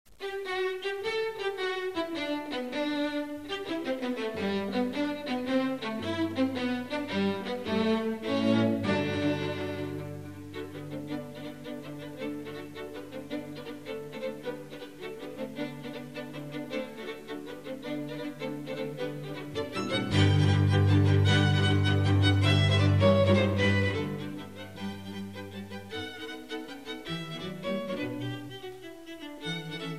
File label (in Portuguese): QUARTETO DE CORDAS EM SOL MAIOR PRESTO